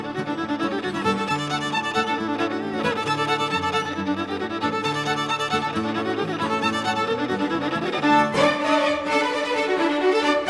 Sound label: Hoedown; Harvest Home; Bonapartes Retreat